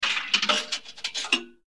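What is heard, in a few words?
vending machine coin drop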